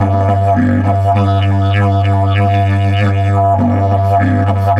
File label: DIDGE 100BPM, Didgeridoo; Big Fish Audio - Didgeridoo and Other Primitive Instruments